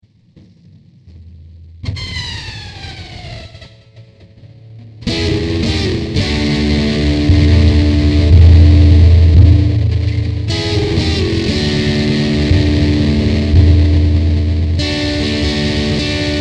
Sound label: No Inferno Guitar Prelude; hyper terrestrial